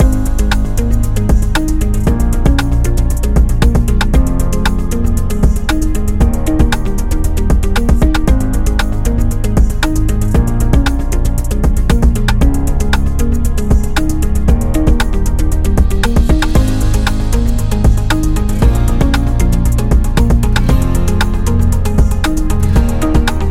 Promo Loop Corporate; hi tech

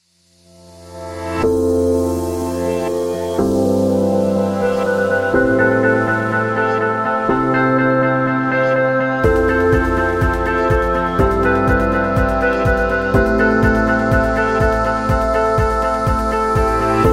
Inspiring Corporate

